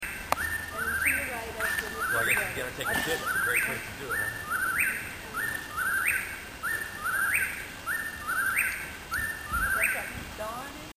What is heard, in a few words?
whippoorwill